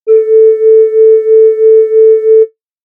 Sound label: bad tune